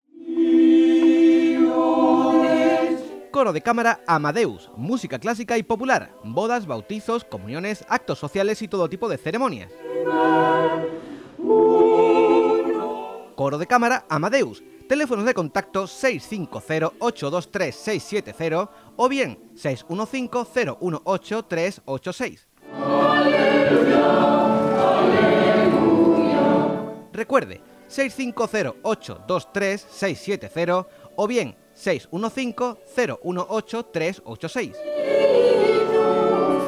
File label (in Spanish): anuncio coro; coro amadeus